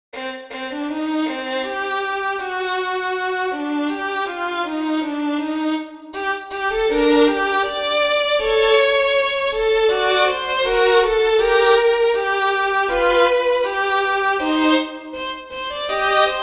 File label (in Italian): preludi per violino